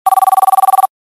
Ringtone Fbi

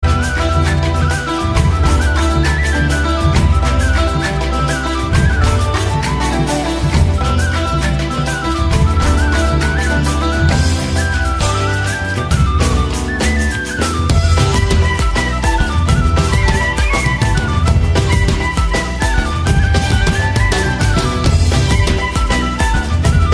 Irish Gnawa

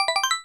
Social Chime

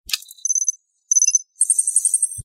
Computer Chirps

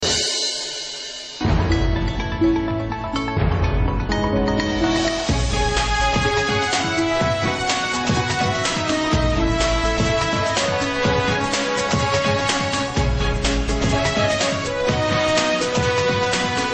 Sad Piano Inst

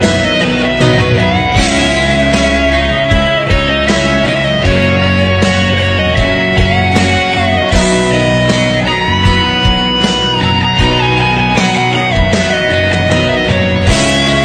Melody

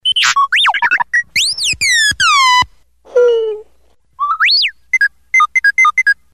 R2d2 Sms

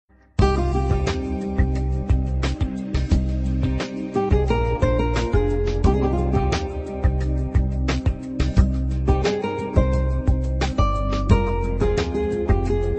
Soft Guitar Music

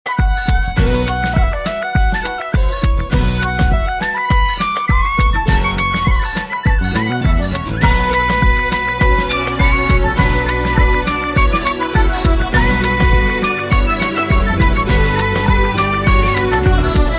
Robo Flute

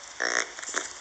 Lemur grunting

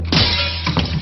Glass Break

